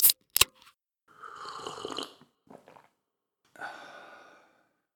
cola

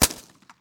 uzi

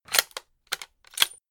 kar98 Reload Start

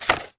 pick

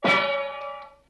pan

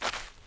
walking Dirt Slow

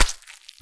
hit Knife

